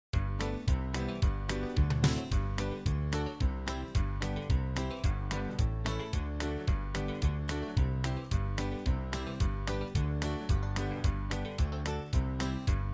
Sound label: blackberry blossom uptempo